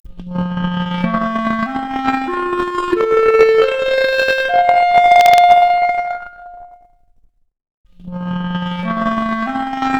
Clarinet arp, Stereo output noised; 3bit

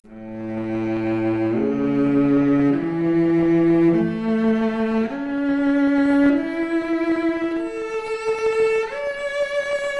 Violoncello arp, Stereo output noised; 3bit

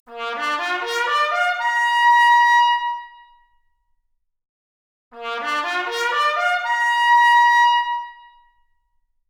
Trumpet arp, Stereo output; 3bit

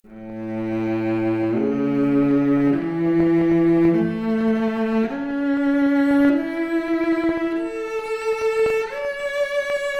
Violoncello arp, Stereo output noised; 4bit

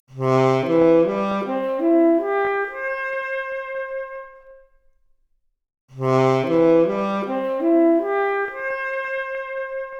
Saxophone arp, Stereo output noised; 4bit

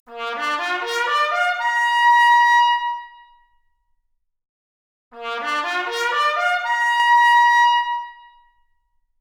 Trumpet arp, Stereo output noised; 3bit

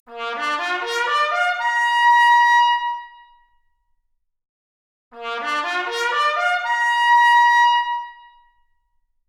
Trumpet arp, Stereo output noised; 4bit

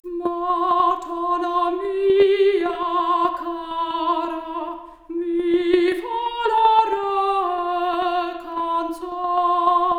Soprano Stereo output noised, 3bit

